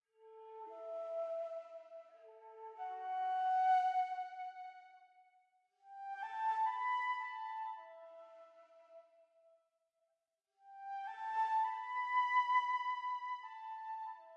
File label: Night Flute; Sky Children of the Light gamerip